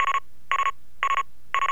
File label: sound lock on opponent